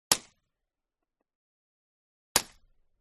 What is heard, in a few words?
Gun Shot Silencer; WEAPONS